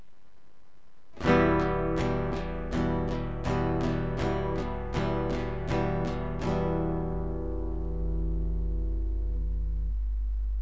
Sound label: strum nylon other picks